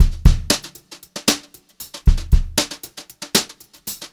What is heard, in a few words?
HOTROD BEAT, Brushed Drum Loops